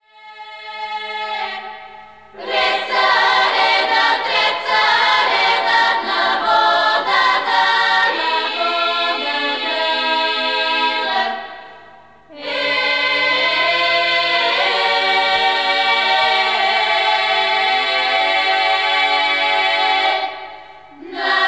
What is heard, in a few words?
female choir; diaphonic